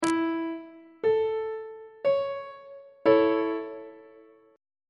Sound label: a major 2nd inversion; on treble clef